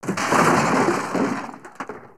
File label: Pirate Arch Wall Smash; destructibles